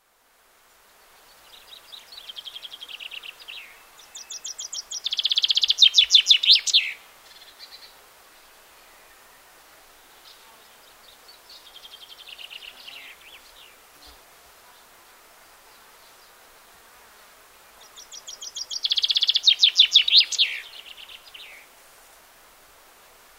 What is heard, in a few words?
chaffinch